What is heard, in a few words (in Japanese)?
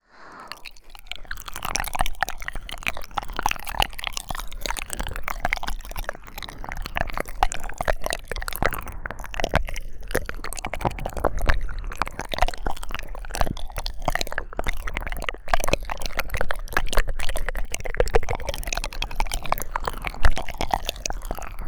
舐めっぱなし